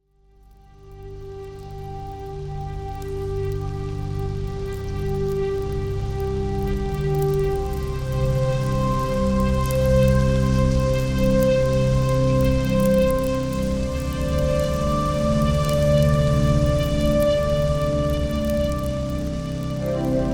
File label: a meditation in a japanese water garden, ambient soundscapes